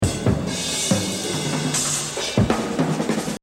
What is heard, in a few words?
Jazz Drumming; Buddy Rich; The Evolution of Jazz Drumming (Gottlieb)